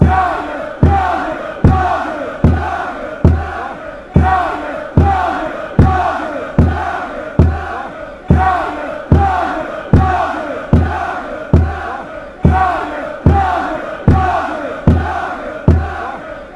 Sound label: chant; mechelen